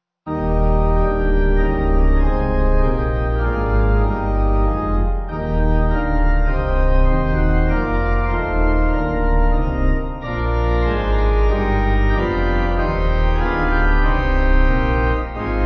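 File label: Pipe, pipe organ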